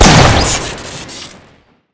spas12 shoot crit; tf2

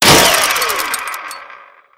heli gun wind down; tf2

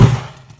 fire hose end; tf2

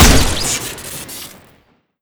sticky roller shoot crit; tf2

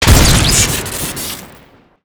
shoot crit, weapons; tf2; family business

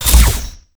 wpn holster; tf2

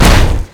car hit; tf2; pyrocar

